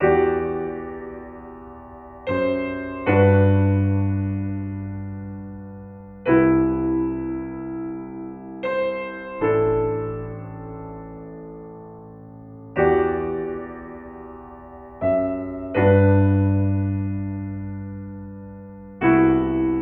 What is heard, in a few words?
piano